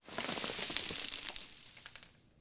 pebbles; The Sum of All Fears

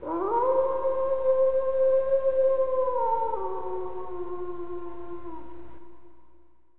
wolf snow; Cross Racing Championship

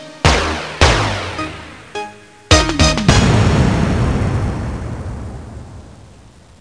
Sonata End; Fastball Fractions

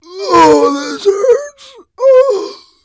PAIN HANG; Messiah; HARPOONED

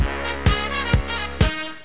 Disco - Asterisk; discotheme

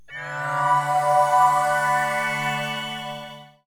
Sound Sting; Essential Guide to Moving or Improving; Alliance Leicester; BBC Good Homes

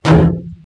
met strk, bugs; Starship Troopers

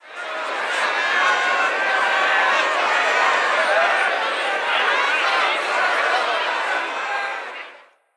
crowd sm angry; Immortal

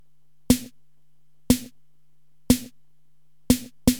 RYTH

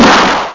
Explosio; black dawn ii

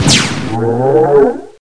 hit yell; XMEN2

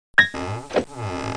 reveal; InventorLabs Technology

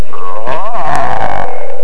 oooooaaah!

